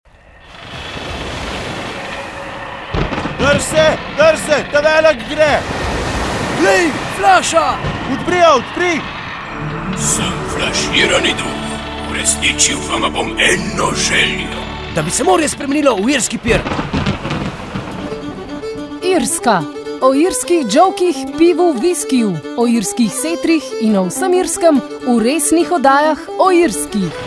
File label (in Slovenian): Jingle Irska